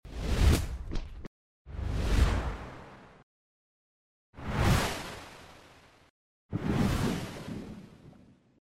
zvuka perehodov

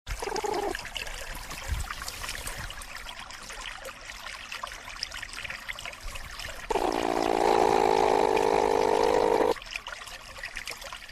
utkonos, zvuk utkonosa

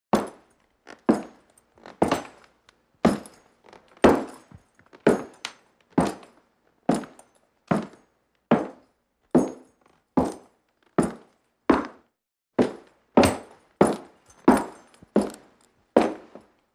zvuk shporov pri hodbe po dereviannomu polu

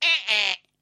eeee otricanie babki

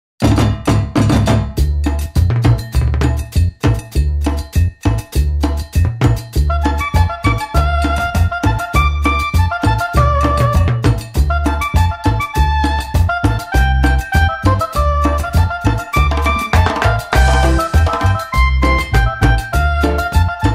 veselaya muzyka

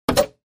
zvuk vyklyucheniya tv

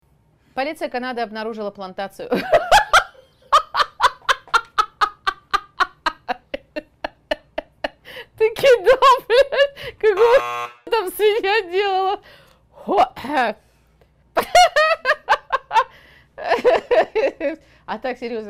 smeh vedushey novostey

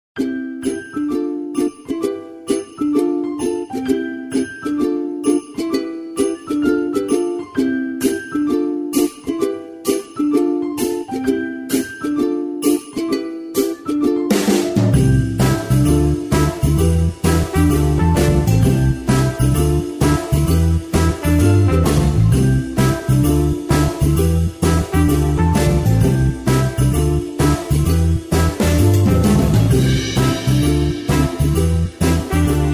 rojdestvenmuz

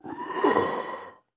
zvuk skeleta

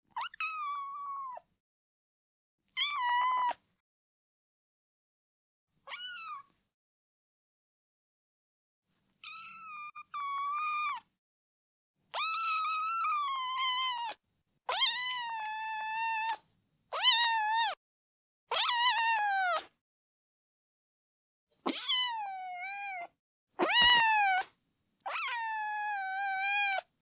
miau kotenka

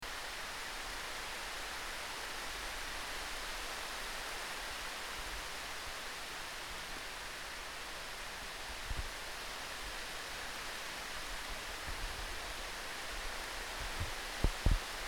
dojd po listiam, Звуки дождя

